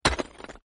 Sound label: crack; Legends of the Hidden Temple - Unlock the Past